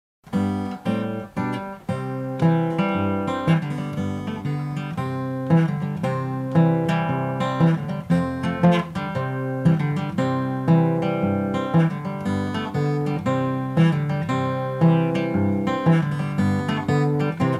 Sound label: Instrumental